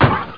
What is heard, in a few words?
thump; Chaseace; 501 Great Games - Volume One; Guildhall Leisure Services